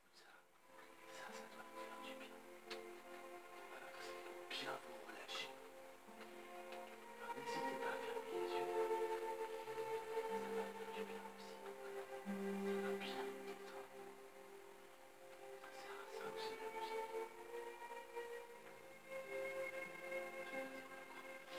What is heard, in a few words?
Vocal